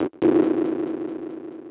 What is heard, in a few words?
KEY SHOT TEKNO 140 A; Techno2; Singleshots